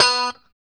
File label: POP B3, STRAT guitar; Spectrasonics; Hans Zimmer Guitars VOL-1; JC-STRAT POP